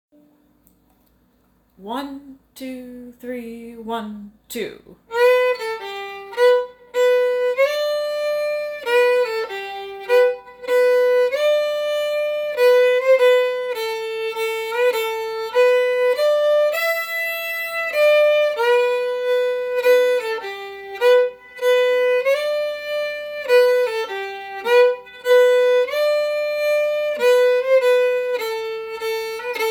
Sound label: Waltz, Traditional Music; Tombigbee Waltz